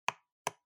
Trackpad Click R

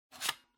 Floppy Disk Drive Insert

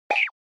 Motion Eating

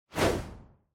Camera Motion Dry; シーン・カメラモーション

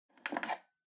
Pendulum Clock Hardware Key Holder Take Out

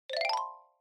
Xylophone Fast Short Up

